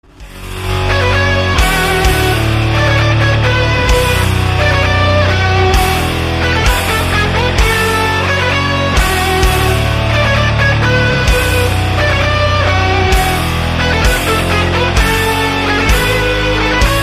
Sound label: METAL RINGTONE